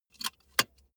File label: car bmw e46 interior safety belt insert soft